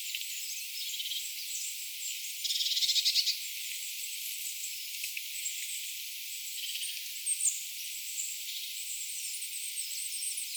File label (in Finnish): pikkulintuparven ilmeisen muutolla olevan ääntelyä